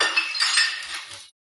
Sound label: Dropping Glass; SoundsCrate